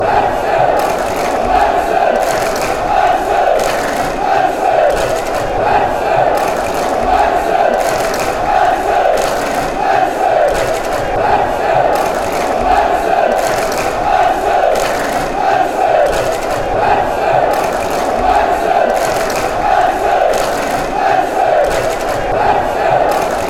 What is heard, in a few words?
clapping, chants; nec